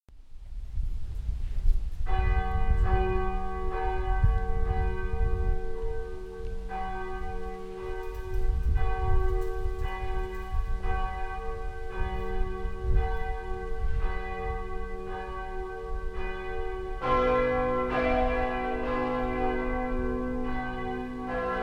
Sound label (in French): volee grandes cloches; campanologie; stpierre